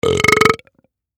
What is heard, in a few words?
Burp